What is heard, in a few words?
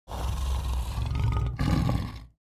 African male lion breath while sleeping